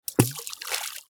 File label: splash, Fluids